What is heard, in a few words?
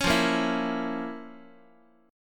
F Major 7th Suspended 4th Sharp 5th F, Guitar